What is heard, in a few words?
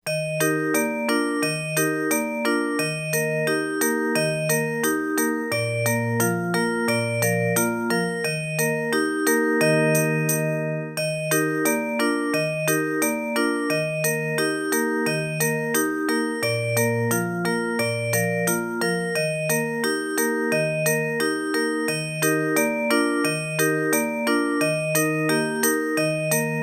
Music Box Slow